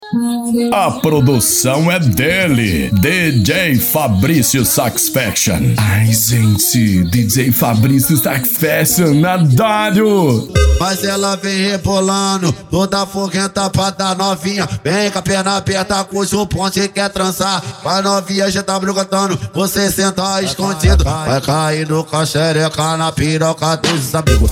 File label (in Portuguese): carreta suprema funk